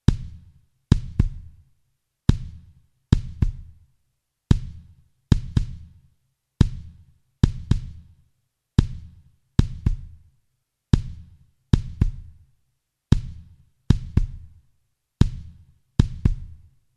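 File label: Kick Compressed; Golden Age Project, COMP-54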